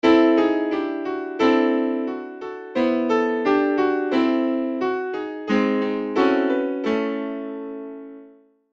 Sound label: piano test